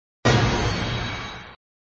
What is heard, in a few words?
Sound SFX, flipper; sttng